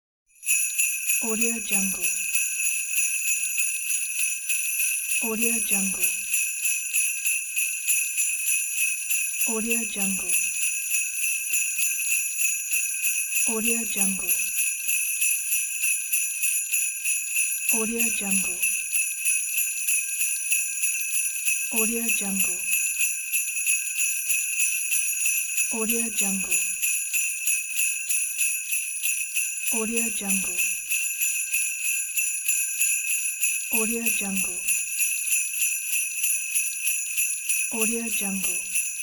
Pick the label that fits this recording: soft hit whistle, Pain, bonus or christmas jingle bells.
christmas jingle bells